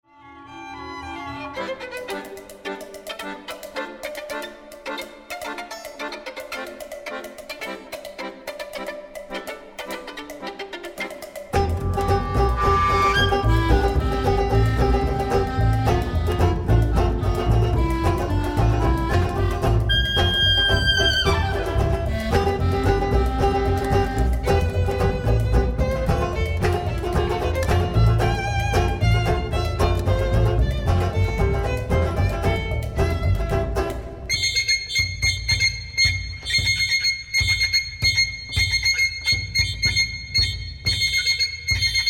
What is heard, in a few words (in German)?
sextett; ensemble works; wesselmann